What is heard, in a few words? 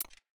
ui click cancel